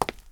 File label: FOOTSTEP CONCRETE